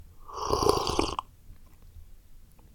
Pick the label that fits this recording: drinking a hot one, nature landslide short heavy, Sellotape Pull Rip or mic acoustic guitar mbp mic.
drinking a hot one